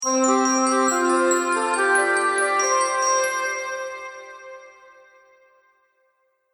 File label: Xmas Finale Chimes And Oboe; Musical Sound Effects